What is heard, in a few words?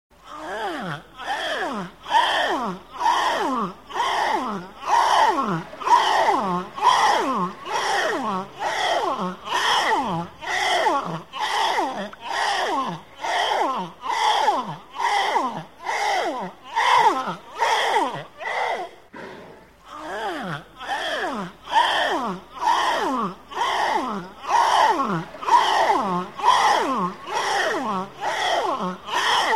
seal